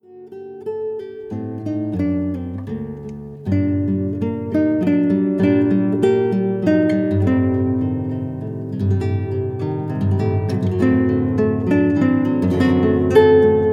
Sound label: siciliano; bwv 1031; reprise